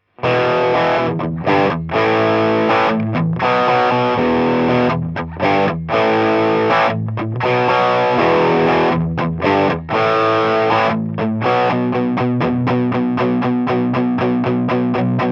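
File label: beyerdynamic m160 guitar sm57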